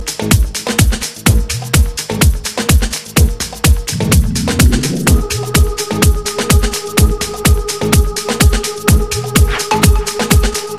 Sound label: Punch Bass Organ